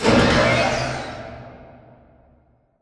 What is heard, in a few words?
Prop Rack Start Close; Prop Warehouse; Titanfall 2; Boomtown